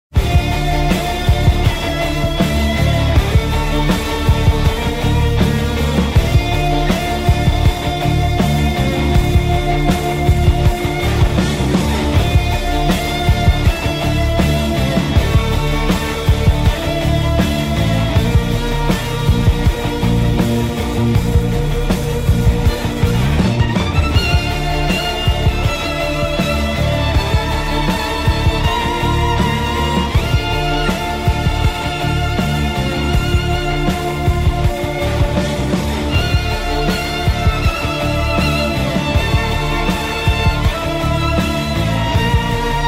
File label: Ending Music; Zakhme-Kari